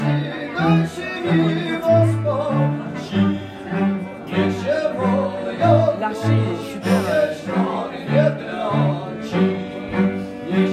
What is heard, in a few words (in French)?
musique